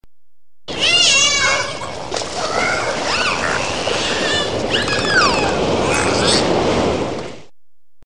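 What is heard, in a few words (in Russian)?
группа дельфинов играет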